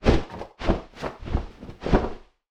beating wings small, creature movement flight wings